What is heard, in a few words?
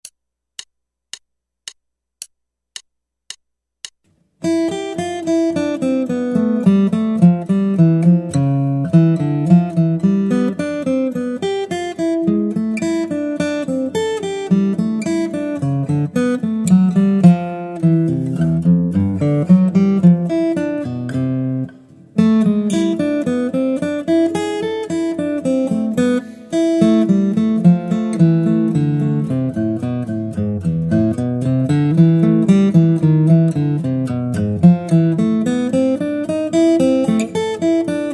Guitar